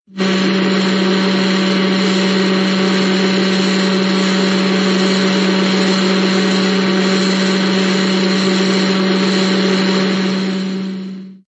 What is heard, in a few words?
Moguera Roar; Showa